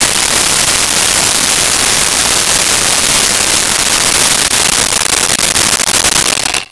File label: Roto Saw; Tools; 1001 Sound Effects